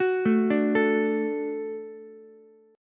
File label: D-Major, D, Ukulele; arpeggiated; JGuitar